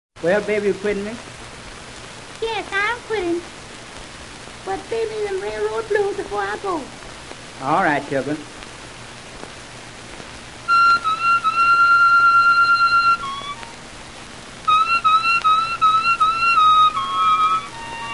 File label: railroad, harmonica